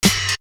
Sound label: Drum, Drum One Shots